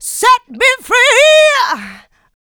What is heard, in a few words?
Female Vocals; Houseworx